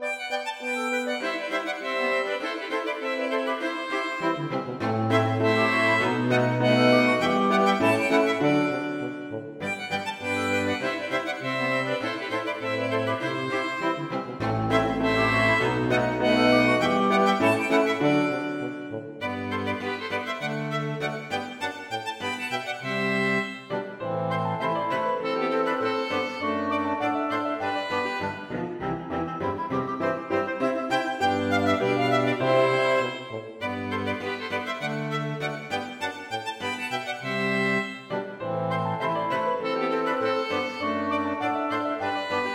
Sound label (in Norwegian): Engelies for fiolin og lite ensemble